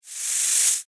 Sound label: Snake Hiss; Animal; Snakes; Sound Effects:Animals